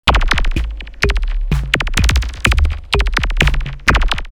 Rave drumloops 126; X-Static Goldmine; 90 s Sample CDs

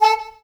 SAX, Drum & Bass, 160 BPM; Ueberschall; Drum & Bass Resonance

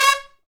BRS TP, Roland, Stabs; Roland L-CDX-03

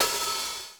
HH O2, R50III; Crypton - DrumBase5000

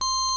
ORG VOX C, Continental; Roland LCDP09 Keys of the 60s and 70s 1

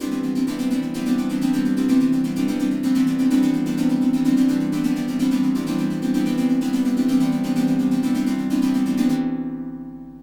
127, SYNTH SEQ; AMG; Global Trance Mission VOL-2

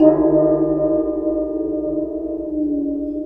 Waterphone Soft Mallet; Sonic Foundry (Sony Creative Software) - Ambient Atmospheres & Rhythms; Ambient-Metals

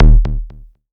kick, Korg ER-1; Kit 10

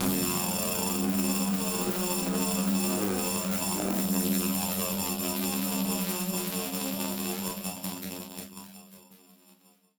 Battered Synth; malfunctioning synth samples

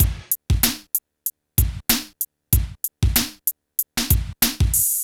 PHATT BEA, Hip Hop; E-MU Formula 4000 Series Vol. 1; Hip Hop Nation; PhattBeats95-99

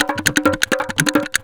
Indian Traditions; USB Soundscan vol.45; AKAI

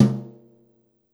B2 Brush Tom 4, Yamaha PSR-E323 Brush Kit

